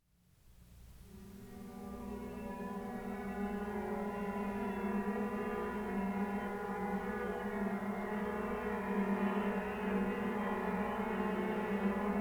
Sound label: horn tone string lead